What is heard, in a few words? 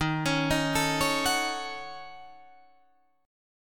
Eb Minor 7th Flat 5th Eb down Guitar Drop D